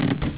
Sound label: thud